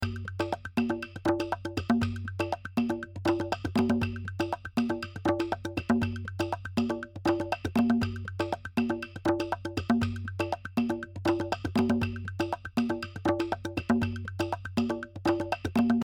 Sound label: bg music